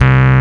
EP Hard C1; Dyno; E-Mu Proteus 2000; Multisamples; Digital Sound Factory Proteus 2000

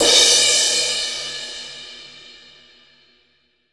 CRASH, Drumscores, KITS MEDIUM; 90s sample CD

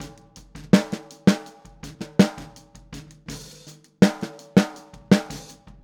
Boogaloo, Snare, 82 BPM; Drums; Verse; Sonic Foundry; Drums from the Big Room The Mixes

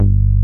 SY BASS G2, ANALOG BASS; Music Store - Claudius Diemeter Schwerlos

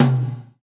GATE TOM, Drums Acoustic; USB Soundscan vol.10; AKAI; 90s sample CD; GATEKIT 1

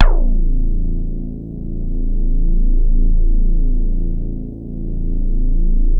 CAT HARD A1, DIGITAL BASS; Music Store - Claudius Diemeter Schwerlos

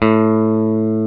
Elec. Guitar A1; Proteus 2000; Multisamples; Digital Sound Factory Proteus 2000

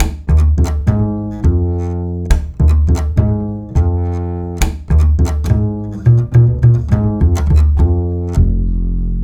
Acoustic Bass

